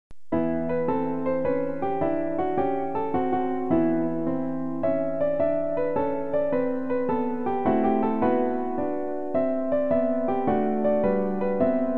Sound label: ancient dance